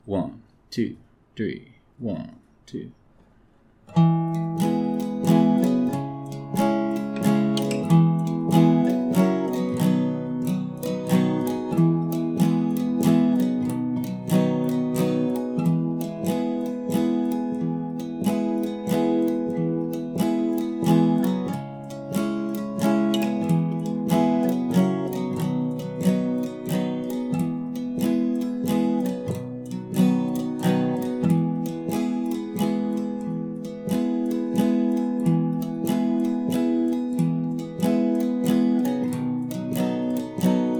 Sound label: star spangled banner backing track e major